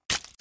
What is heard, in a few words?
Splat